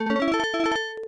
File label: victory; Pushover